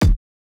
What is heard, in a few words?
kick, house